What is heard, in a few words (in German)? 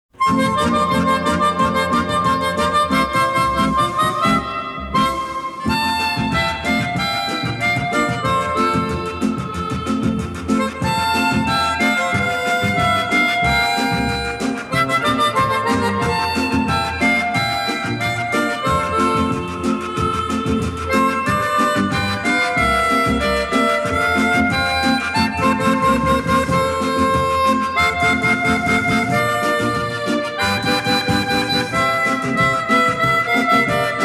Mundharmonika Trio - My Bonnie Is Over the Ocean